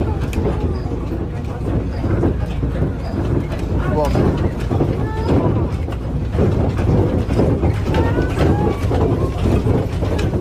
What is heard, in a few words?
TAIWAN TERROR EARTHQUAKE TRAIN